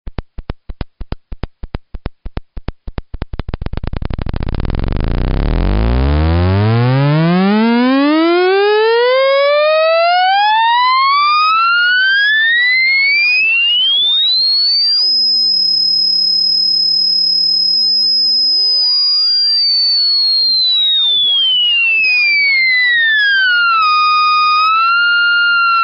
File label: osc fm